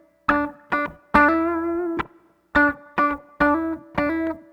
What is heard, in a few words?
Garage Funk Guitar, 70s Analog Funk; Sonic Foundry (Sony Creative Software); What it Is!